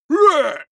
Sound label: male shout hit heavy